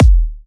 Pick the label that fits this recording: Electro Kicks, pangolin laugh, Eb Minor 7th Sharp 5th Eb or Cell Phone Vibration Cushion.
Electro Kicks